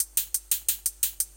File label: Hat 175, Drum&Bass Perc Loops; EZRollers